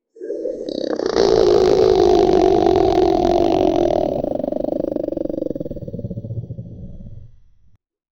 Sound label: dinosaur scary